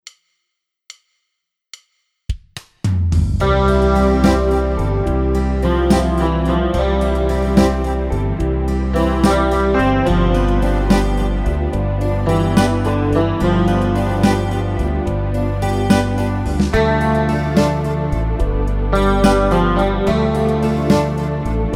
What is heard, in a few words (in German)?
E Gitarre Slow Rock; Wersi